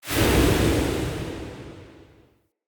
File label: Crypt Wind Trap Deactivate; Champion Crypt